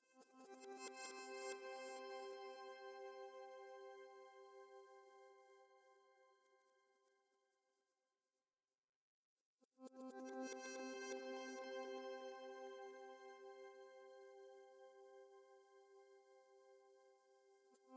Guitars; risk of rain 2 engineer edition 2

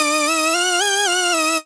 Beat

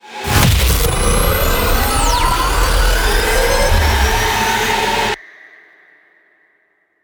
combat 140bpm; combat UAC 03 140bpm wip GLORY 05

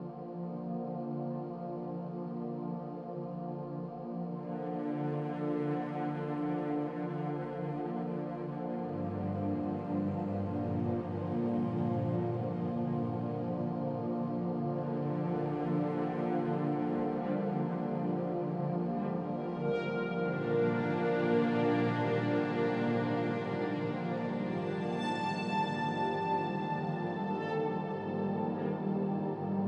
Intro Drone; Papetura

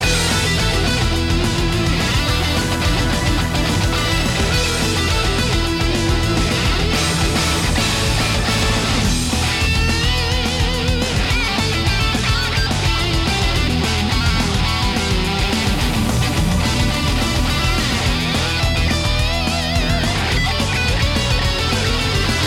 battle; Sengoku Otome Legend Battle; PS Vita

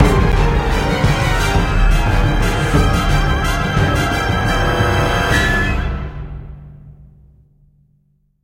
Terror Sting; Jurassic Park The Game

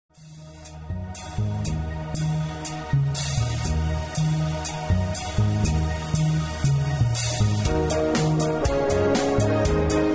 game music; Into Space 2

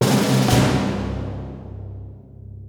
Dialog User Attacks; rise of venice windows gamerip 2013

